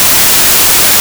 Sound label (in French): bruit blanc stereo